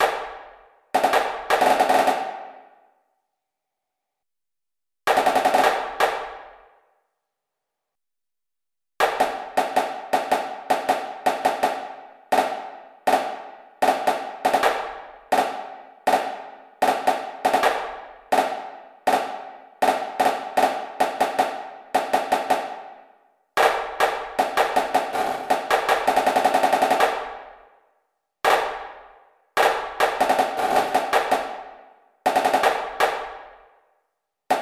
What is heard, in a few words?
snare